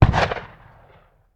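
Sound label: Cannon Black Powder; Sound Ideas; Guns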